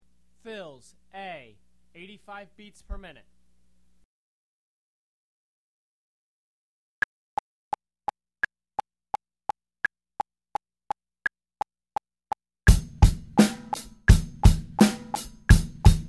fills, drum; Drum Lessons

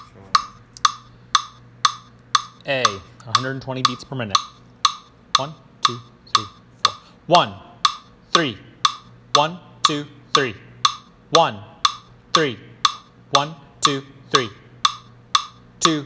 Drum Audition 120BPM; Drum Lessons

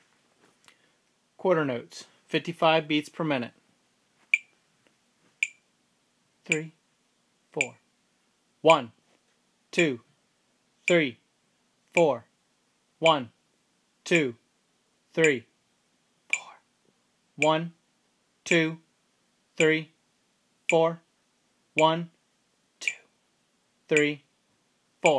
Quarter notes 55 BPM, Drum; Drum Lessons; Beginner Drum Beat

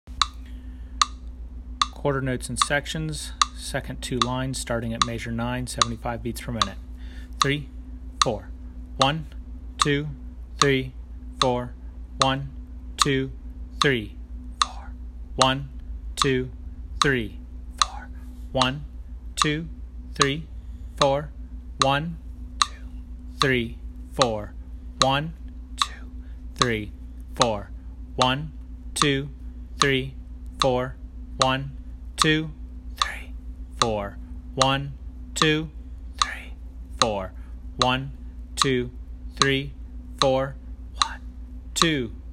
Quarter Notes 75BPM; Drum Lessons

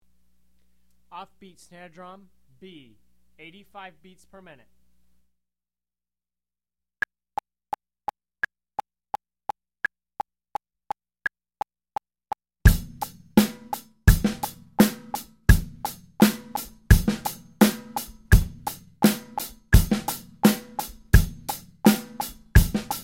off beat snare drum; Drum Lessons

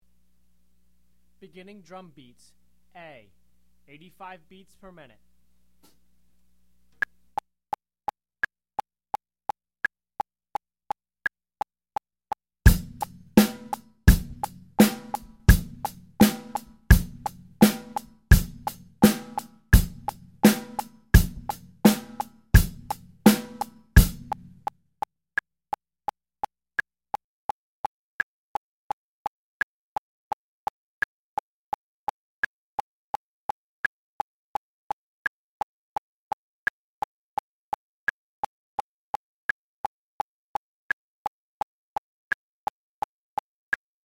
beginner drum beats; Drum Lessons

